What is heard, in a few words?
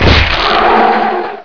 hive hit; Hexen II; pest